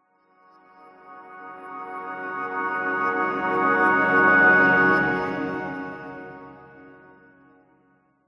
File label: fantasy reversed backwards magical glissando